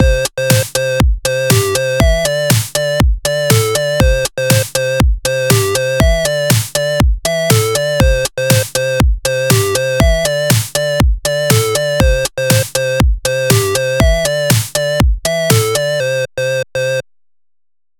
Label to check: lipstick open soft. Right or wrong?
Wrong